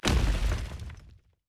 Medieval Tower; Rabbids Multiverse

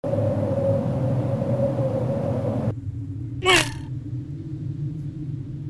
Bungee

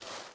Sittable Office Chair Stand

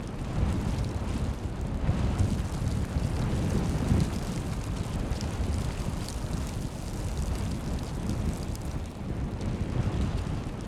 Blackhole Loop; Folk Hero

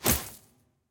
Item Buy

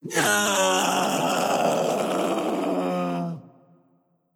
Mirro Scream

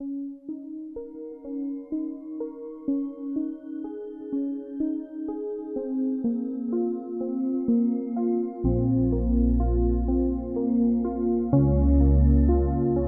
Game Over Loop; Dungeon of the Endless; DotE GameOver Loop

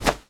Mantis Misc Jump

